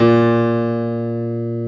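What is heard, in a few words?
stereo piano A#2; AKAI S-Series CD-ROM Sound Library VOL-2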